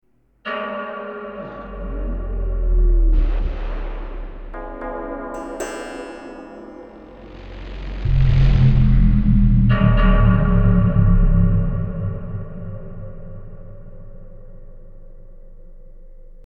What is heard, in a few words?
Octapad